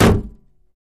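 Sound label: Metal Puncture Clunk, small impacts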